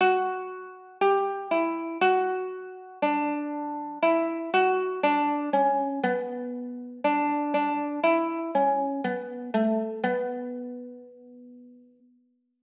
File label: aeolian